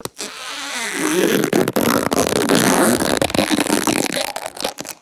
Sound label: squeaky rubber balloon stretching